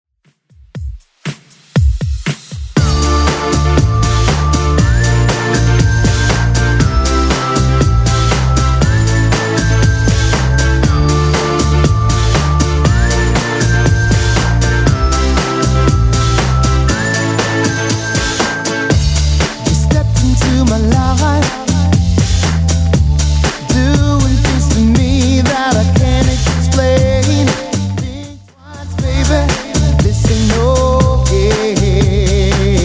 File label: 90s Redrum 119bpm